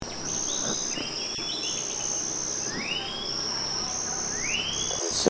kehicap flores

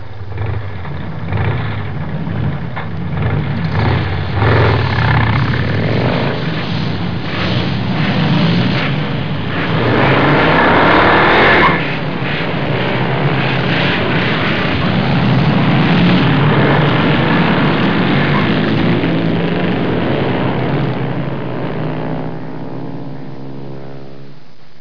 suara mobil

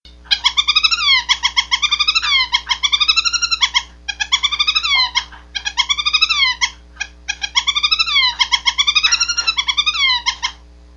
suara owl finch jantan

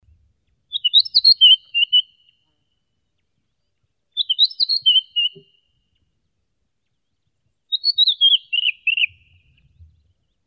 suara redwinged bl

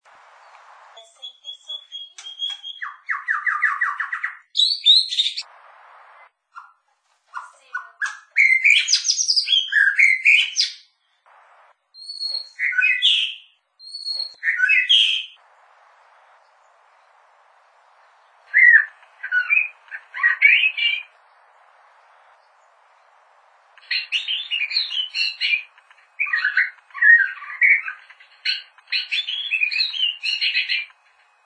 suara murrai batu tidak teratur

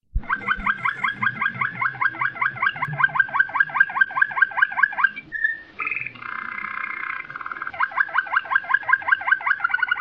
kenari waterslager

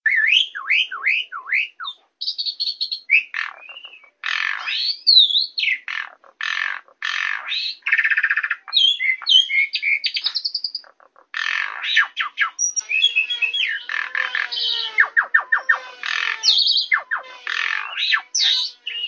jalak suren

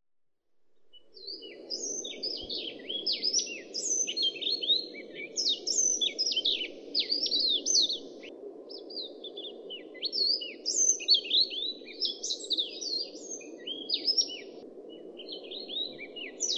suara fiji whiteeye

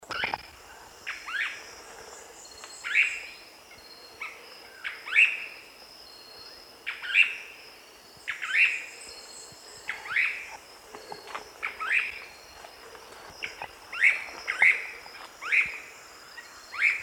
suara panggilan burung cryptic treehunter

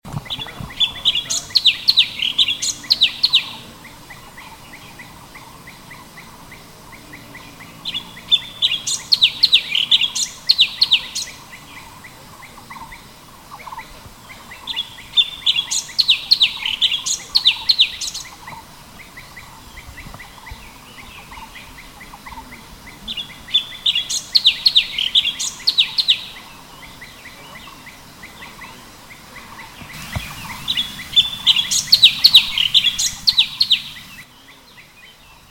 suara kicauan cucak cungkok

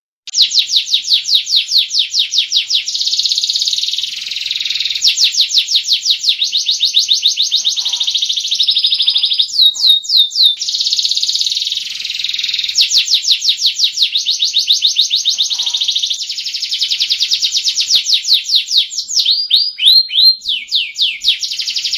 suara kicauan kenari standar

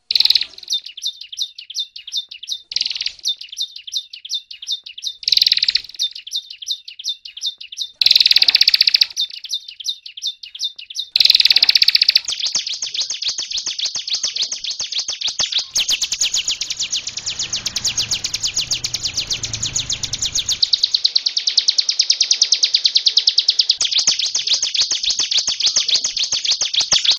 kombinasi cigun daput

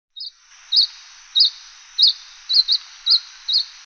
suara call pleci laut

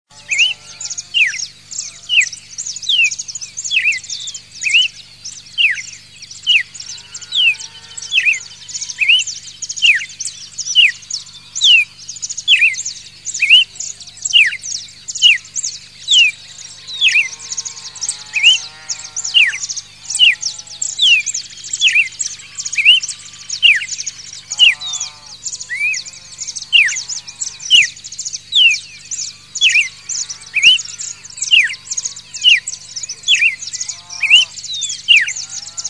suara kicauan burung red-crested cardinal variasi

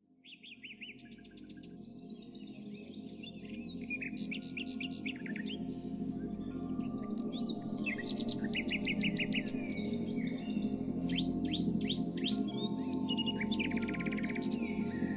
resonance brainwave burung kicau

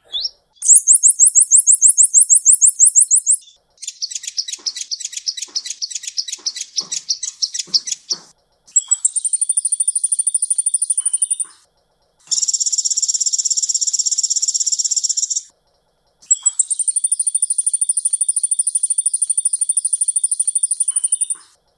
kolibri ninja

